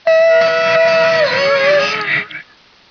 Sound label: zombie idle; svencoop